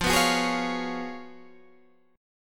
Db 11th F, guitar

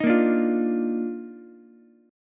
Eb Major 7th Suspended 2nd Eb Ukulele; strummed; EbM7sus2

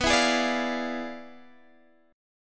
E 7th Flat 9th, B

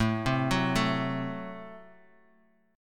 A Minor 6th A Guitar Half Step Down

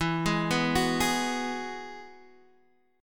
E Major Add 9th, Guitar Half Step Down

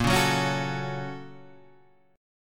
Bb Minor Major 7th Sharp 5th; BbmM7#5; strummed; x,x,8,6,6,8,8,6; JGuitar

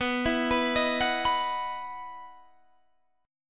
E Major 7th Suspended 2nd

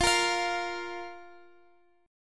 A sharp 5th F

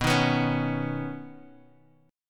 B Major Add 9th, Guitar Drop D chord

